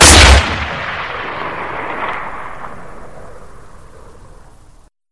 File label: kar98k mauser